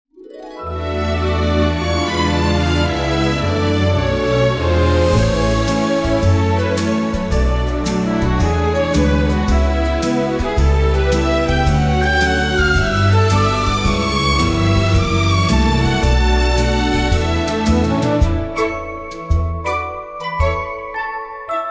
Seasons in Swing, Romantic

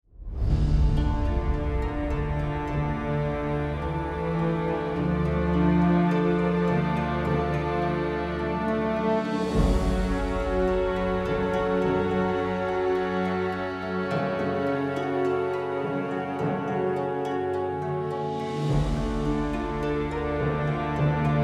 Togakure suspense

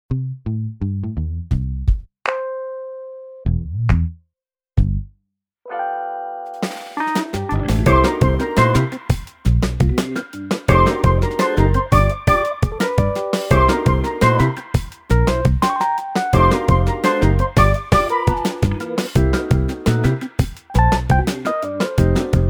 Jam Cat cool; opener

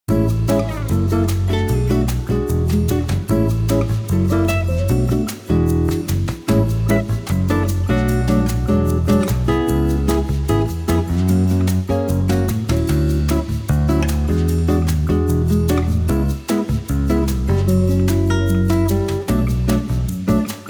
Bossanova Heaven; suedamerika

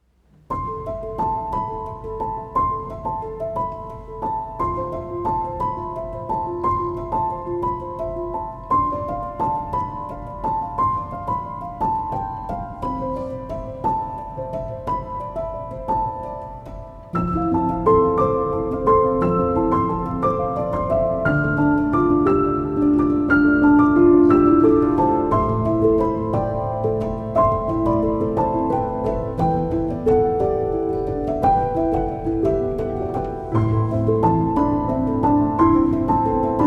piano

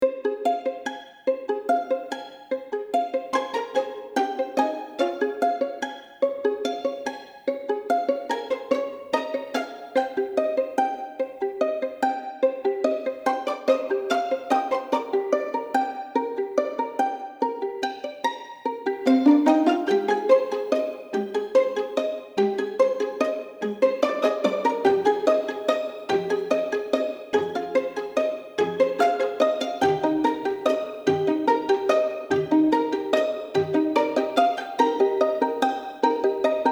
Dance of Time pure pizz; repetitiv

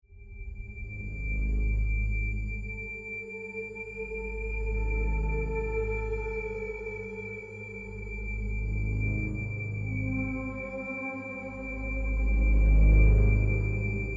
atmospheric; reportage

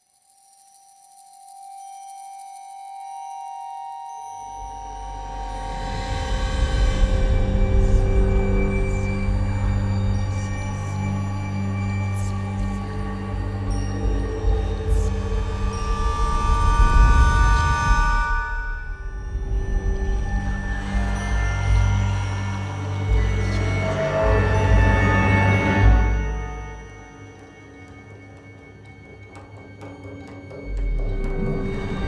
Hagstone suspense; ernst

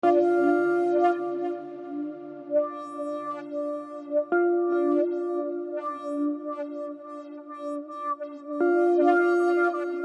First Contact ambient, suspense

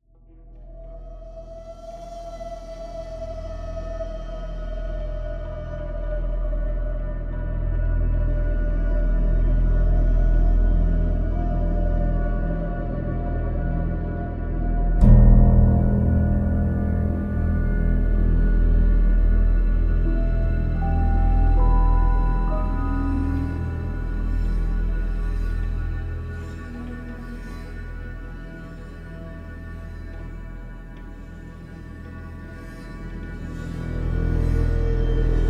Teeth dark

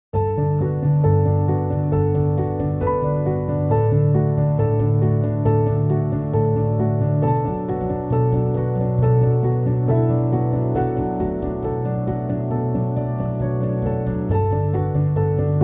piano solo

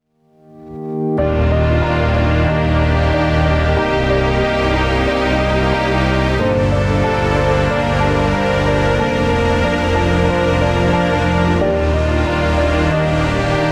Concrete Soul big

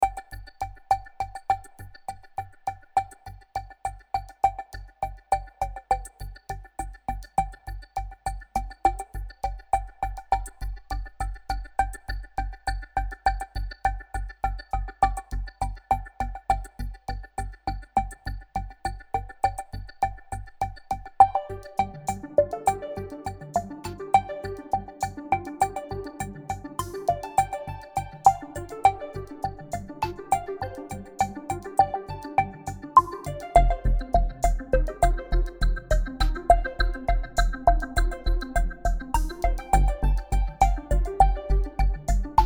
Calculations pulse, modern

